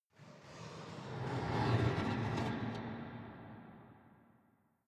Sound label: metallic impacts